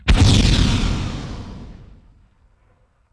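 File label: explosion small generic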